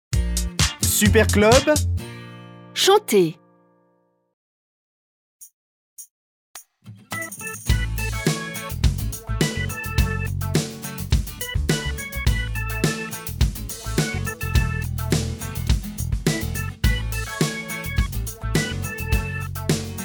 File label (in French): karaoke; super club